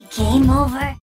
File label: Game Over